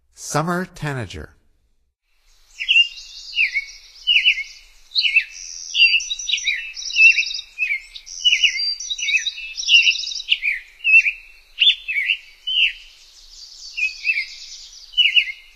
Summer Tanager, Songs of Birds of North America; Stokes

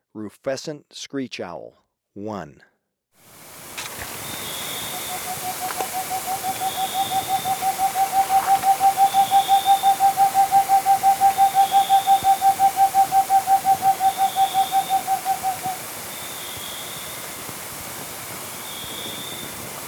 Rufescent Screech-Owl, Birds of Ecuador; S. America

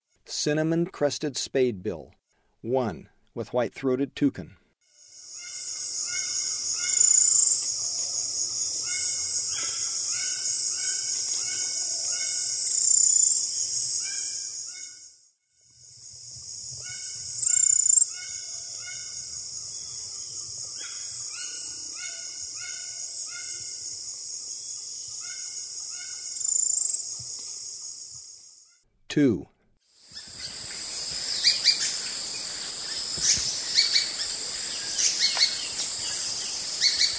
Cinnamon-crested Spadebill; S. America; Eastern Lowlands

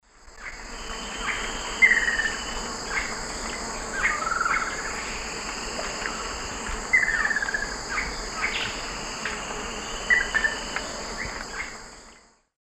Monasa morphoeus, calls; S. America; Eastern Lowlands

